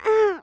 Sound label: die archer female